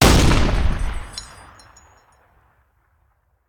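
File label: Artillery Cannon Anti Aircraft; Sound Ideas; Guns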